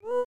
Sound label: Jump; Pink Goes to Hollywood; SNES